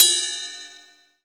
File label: CYM Ride; Best Service Gigapack 2